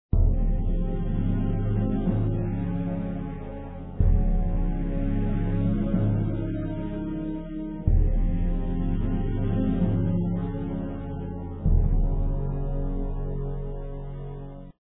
GameMusic; SpongeBob SquarePants Invasion of the Patty Snatchers

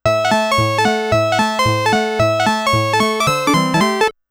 Game Start Music; Namco Classic Collection Vol.2; arcade soundtrack

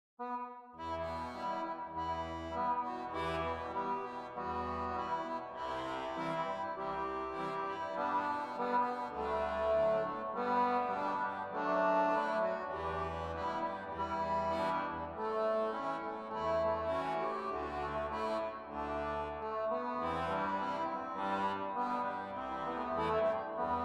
Accordion; Return to Monkey Island

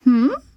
Emote Female Confused

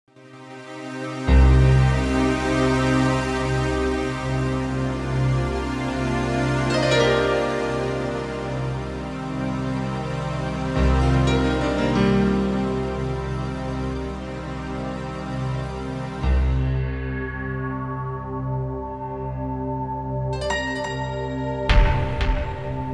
Paris Streets Ambient

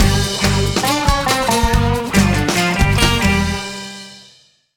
(Jingle) Victory; rayman arena

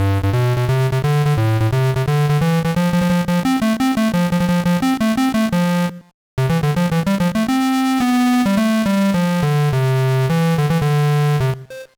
Bonus, GBA; Sheriff

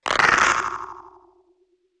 dw bumps

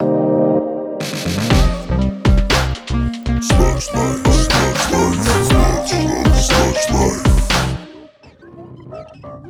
Boot Up

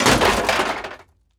Crash Objects Medium Metal; gamerip; Car Simulator 2; android

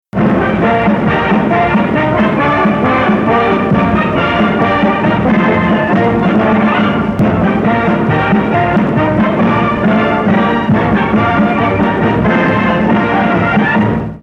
music; Dino D-Day; guts

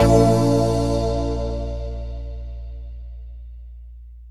Retry Jingle; Friday Night Funkin' Vs. Bambi Strident Crisis

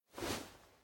Cloth Crouch Down; Devour

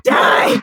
Hit

